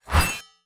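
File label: cavalry sword, weapon; Battle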